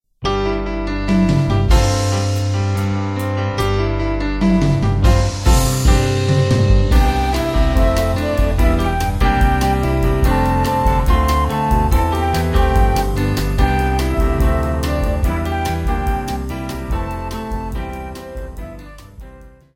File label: Instrumental; Jesus Loves Me